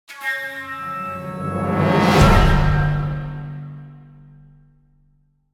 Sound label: combat end